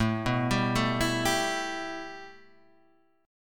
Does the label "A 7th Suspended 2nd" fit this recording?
Yes